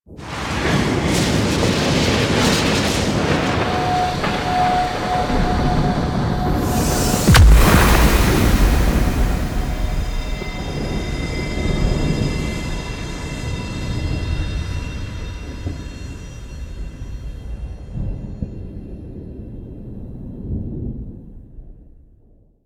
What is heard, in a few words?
Ghost Ship Emerges; Call Of The Tempestarii; Wraith Quest